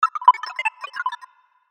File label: high signal